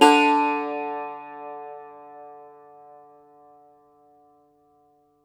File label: Cumbus Saz; E-MU Producer Series Vol. 7 – Old World Instruments